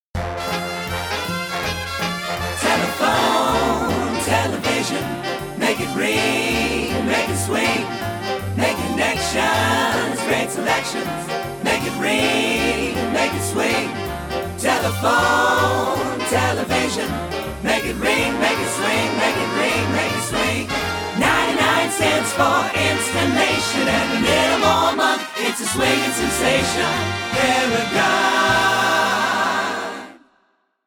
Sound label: Jingle; Paragon Cable